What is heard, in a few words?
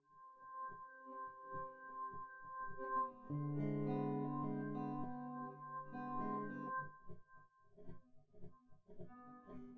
nodrums synthconv